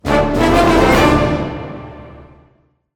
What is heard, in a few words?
Pirate Cove Stinger